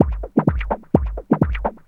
FX Loop 128 BPM, House

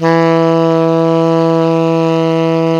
TENOR PP E 3, Saxophones; Greytsounds; Philip Wolfe David Crozier's Saxophones

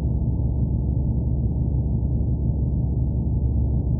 sparse soundscape samples; ReLooped

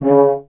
tuba; Miroslav Vitous - Solo Instruments VOL-2

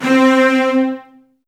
Virtuoso Strings, TRM FX

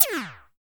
VIDEO LG P; TEK PERC3

